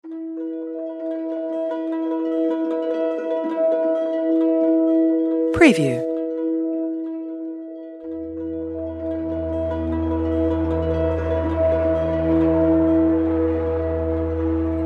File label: Dark Suspense Scary Horror Eerie Storytelling Music Background Crime Documentary